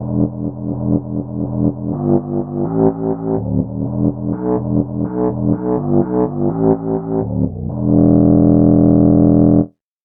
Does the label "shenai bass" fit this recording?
Yes